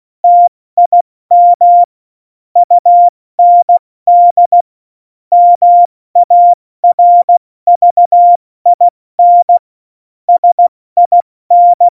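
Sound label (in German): Witz, Morsekurs, cw morsen; Tempo 70 F80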